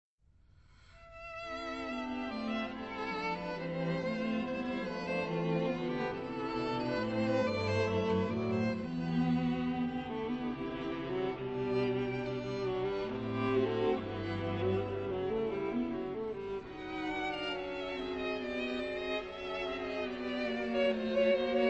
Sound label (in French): Quatuor la